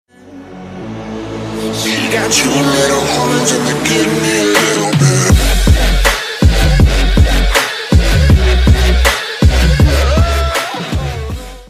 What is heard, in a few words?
horns sound